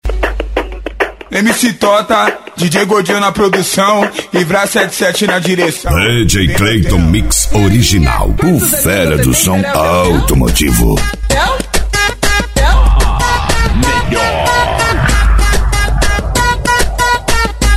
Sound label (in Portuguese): especial funk e electro house